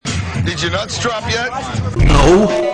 nuts drop

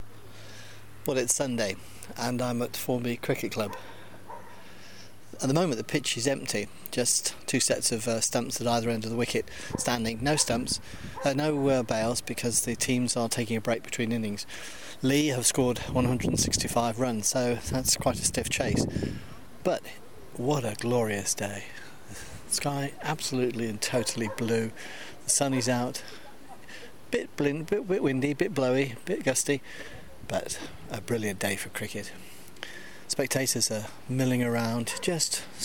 cricket club; ormby

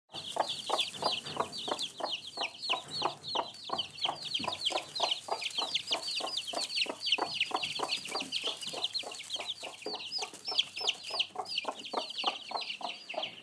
chicks

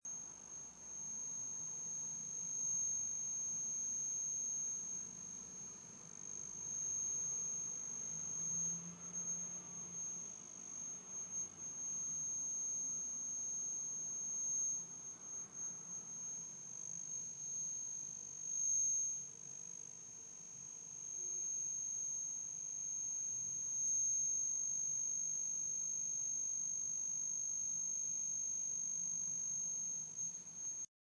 crt hum

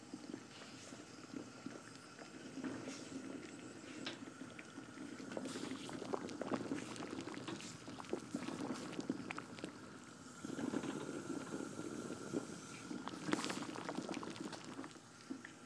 bubblestorm

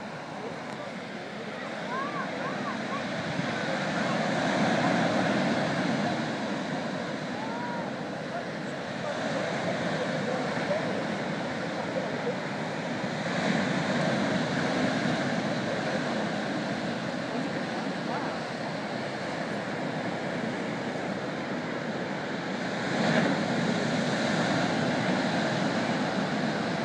sounds of waves breaking on Southwold seafront

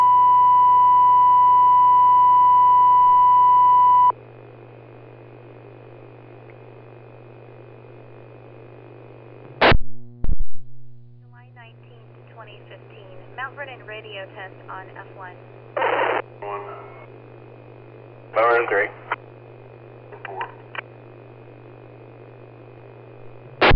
medical tone page; mvfd

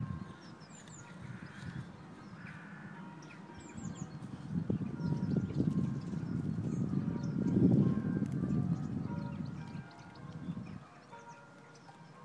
finches bells

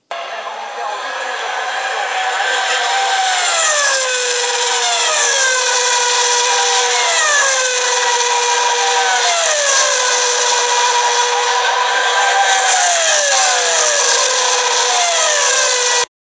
spa eau rouge f1

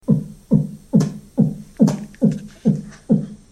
bass beat

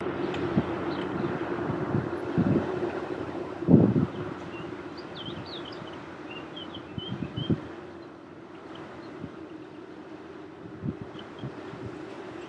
lunchtime sounds today

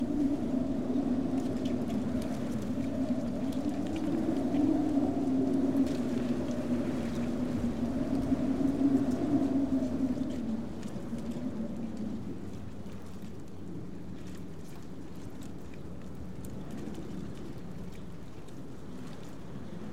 wind and rain in Cornwall

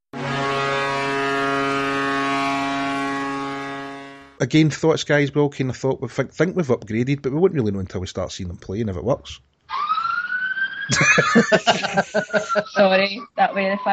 siren; looper clip

